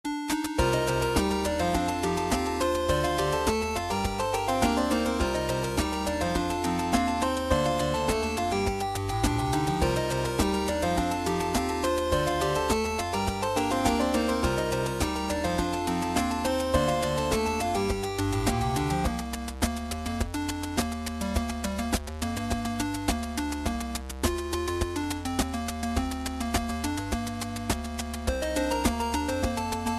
8-Bit; PVZ

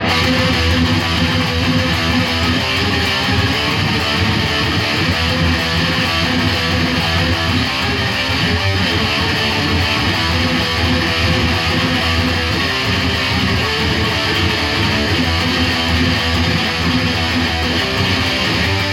METAL

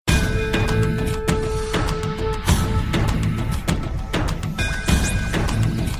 Cricket Ipl Tone

